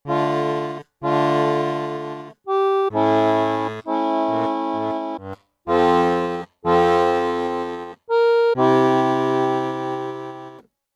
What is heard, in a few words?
c mean tone